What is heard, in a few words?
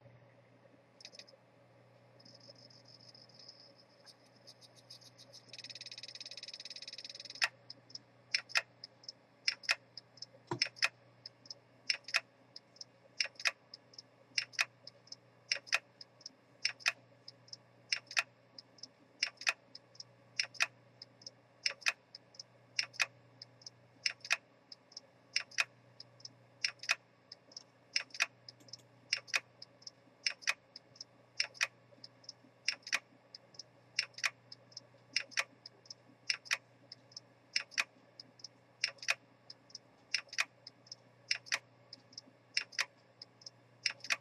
hdd fail sound; Swiss